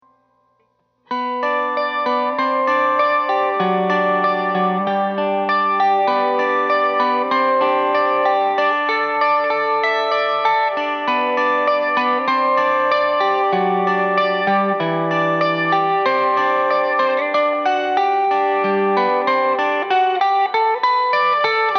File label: mando dry